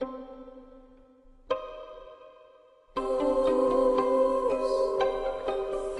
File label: strings, Sad Loops